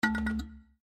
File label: Hardware Fail; Savanna